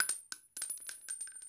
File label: shells; The Sum of All Fears